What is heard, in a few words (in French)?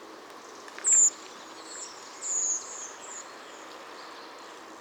Grive mauvis Turdus iliacus